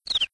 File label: collide; amazing